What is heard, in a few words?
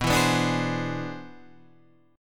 C Augmented Major 9th B